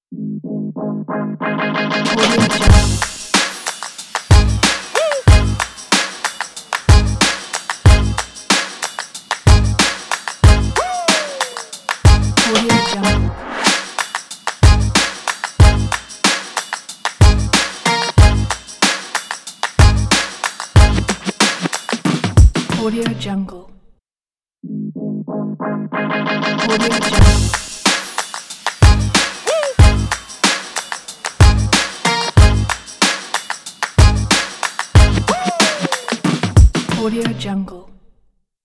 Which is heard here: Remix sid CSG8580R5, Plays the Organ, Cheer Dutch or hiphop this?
hiphop this